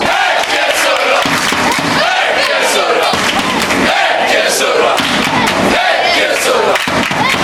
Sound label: buzzing fanchants; hacken